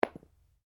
Cartridge 22 caliber long impact carpet, shell casings; shell casings of different calibers impacting on different surfaces